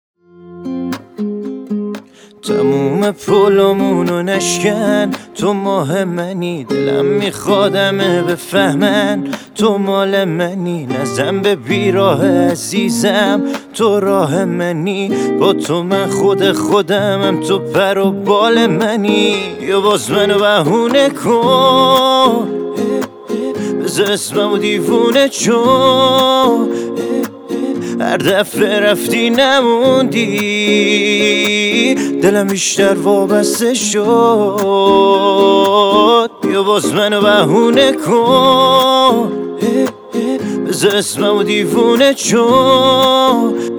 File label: Guitar Version